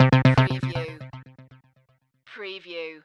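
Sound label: Production Element Hit Synth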